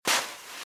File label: Footsteps - Sand - Dry - Walk - Right; RadwinIsland